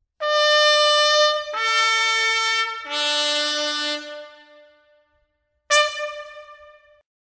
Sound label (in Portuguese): Descansar Arma, toques